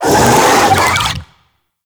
Infested Big Ashen Vocal Attack; Warframe

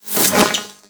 Projectile Whizz By; Enemies; Abilities; Warframe; Sentient; Brachiolyst

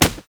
Melee Fist Impact Generic; Fists

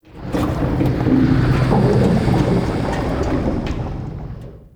Metal Pipe One Off; Warframe; Ambience; Entrati

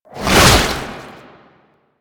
Underground Attack Tentacle Swing; Enemies; Abilities; Warframe; Proto Infested; Babau

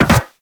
Land Soft Metal, Footsteps; Characters; Operator

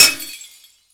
Glass Impact; Warframe

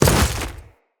Step Ice, Footsteps; Enemies; Grineer

